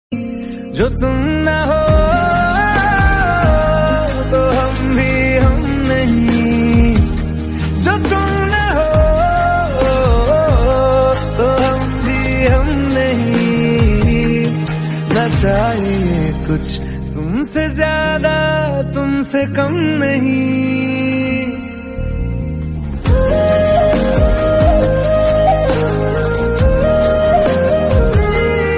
Love Song Ringtone

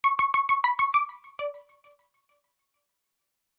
Mexican Sms Ringtone; ringtones

